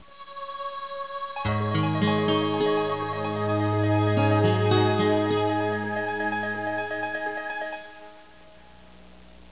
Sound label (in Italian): musica newage